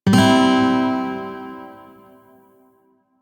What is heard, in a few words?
Shawzin Pent Maj Chord G; Music; DE; Warframe